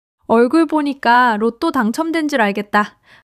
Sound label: laughter